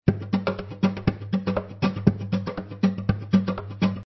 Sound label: dry guitar